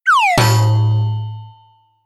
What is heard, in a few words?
notification; samsung Aleart